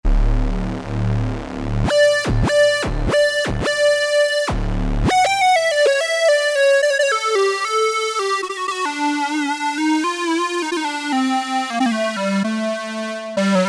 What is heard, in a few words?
SL SqrMono, Yamaha TG77; Factory Presets